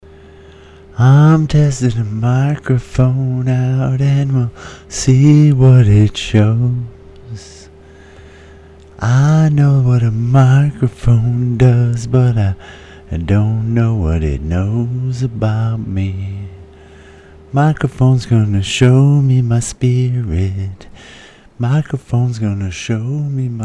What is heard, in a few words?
yeti recording microphone song